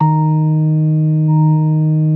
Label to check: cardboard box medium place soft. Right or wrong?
Wrong